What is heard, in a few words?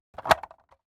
Cassette Player Door Open